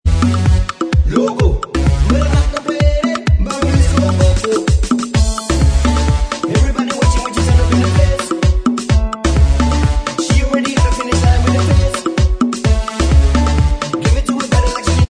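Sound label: backing vocals track, backing tracks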